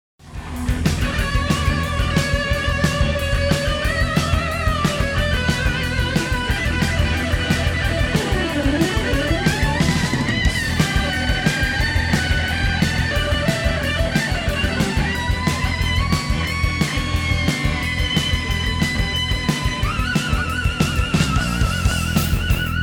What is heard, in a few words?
C Hores GUIT